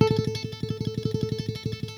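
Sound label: classical pick, guitar; Greytsounds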